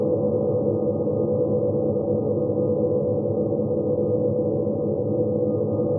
InfiniVerb Voice, sparse soundscape Loops

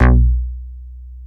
HIP BASS; East West; Global Sounds; DIGIBASS

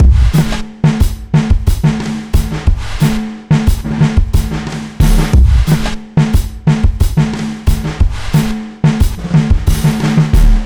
090bpm, Downbeat Dance Grooves

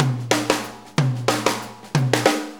Shuffle Fill, Drum Tools

